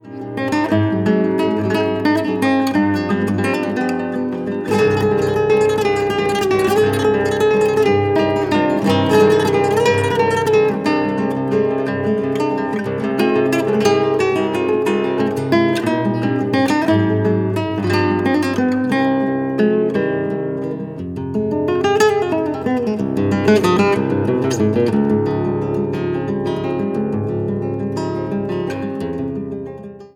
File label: marelle tango